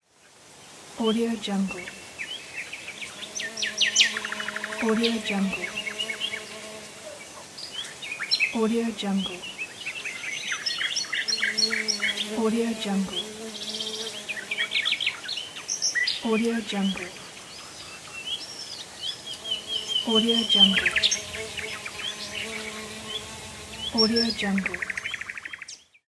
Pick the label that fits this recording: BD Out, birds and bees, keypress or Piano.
birds and bees